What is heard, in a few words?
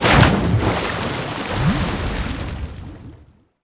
CRASH; Splashdown; XBOX